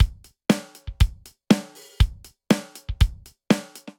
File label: Gen Purpose Kit, Drum Kits, Basic; Ableton; Drum Booth